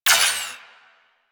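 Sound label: submachine gun Shot; Warframe; Tenno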